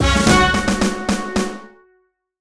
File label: empire game settlement alert victory drum hit trumpet brass